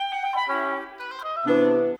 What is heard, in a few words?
Winds, Orchestral; Sonic Foundry (Sony Creative Software); Orchestral VOL-2; Modern 19